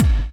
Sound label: BD; Best Service; Dance Mega Drums